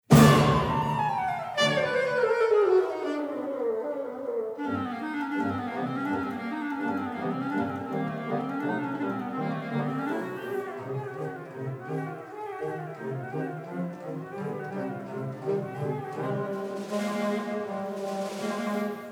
Wind Symphony and Woodwind Faculty Flight of the Bumblebee